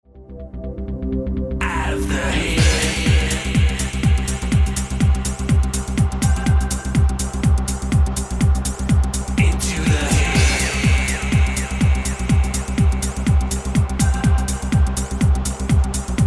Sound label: Electric Guitar Humbucker